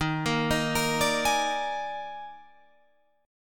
D sharp Major 7th Suspended 4th D sharp Guitar Drop D, chord; chordshape; 13,13,13,15,15,16